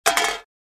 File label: Metal bowl falling on concrete